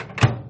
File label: Cooking